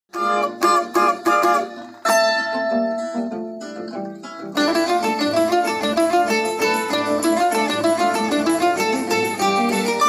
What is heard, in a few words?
Cavaquinho; کاواکینیو